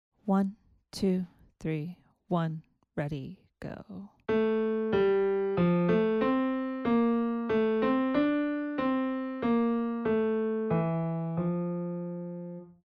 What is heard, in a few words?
melodic dictation